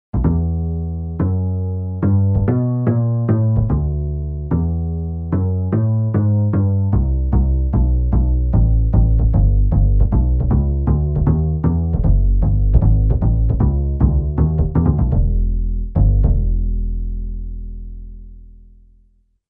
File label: Woody Bass; Factory Patches